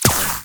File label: Shield Impact; Warframe; Tenno